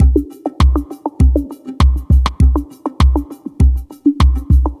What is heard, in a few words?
DOWNTEMPO BEATS; Sonic Foundry (Sony Creative Software) - Future Retro Dance Excursions